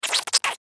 Squeeze Condiment, Squeeze Condiment Bottle; Cartoon Sound Design; Blastwave

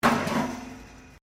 METAL BIN IMPACT WIRE FENCE; Materials

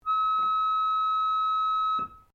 ORGAN PUMP OBOE E5, Old portable church organ; Keyboards (Sound Effects:Musical)

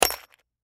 Bullet Shell; 44 Drop Debris; Warfare; Drops (Sound Effects:Objects); Blastwave

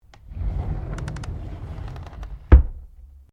Drawer Interior Wooden Bathroom Large Full Open; Various domestic drawers; Household

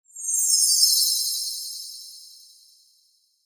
Shimmer Flutter High; High Tone; Blastwave

